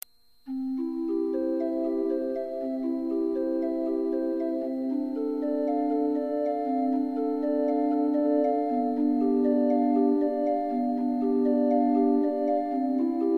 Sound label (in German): Praludium C-Dur; BWV 846; Bach